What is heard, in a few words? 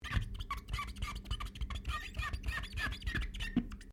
GLASS SQUEAK RUBBER SEQUENCE